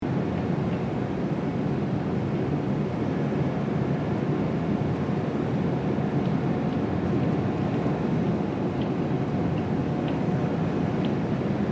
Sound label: Refinery factory outside perspective machinery loop